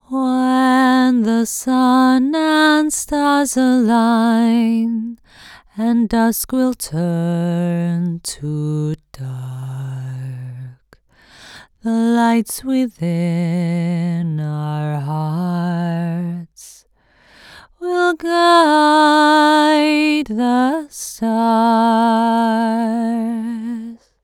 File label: Top Line Low Vocals - Fm 80Bpm; Construction Kits; Ghosthack; SkyRealm; Kit 08 - Into The Light